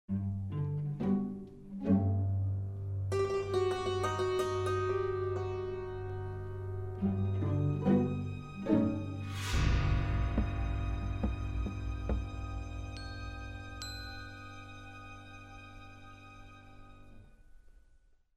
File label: Music; Age of Empires III; cinematics